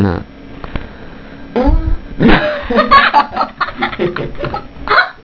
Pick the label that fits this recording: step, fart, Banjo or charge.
fart